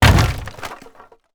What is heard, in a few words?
wood break